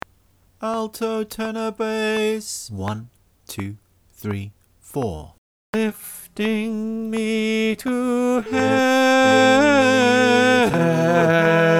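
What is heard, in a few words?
alto click track, choir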